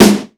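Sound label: tom snare drum; Best Service; Hyper Dance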